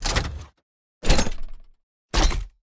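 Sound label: footsteps metal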